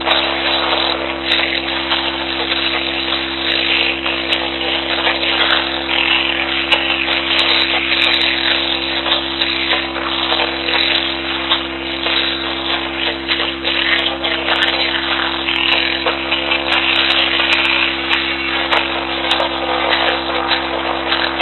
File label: Aircrafts Cockpits FM Intermodulation; DCS